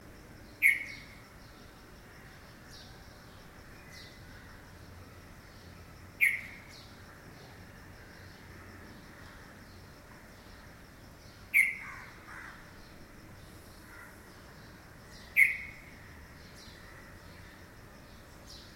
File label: Pycnonotus cafer - Red-vented Bulbul